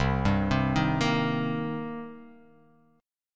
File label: C Minor 7th C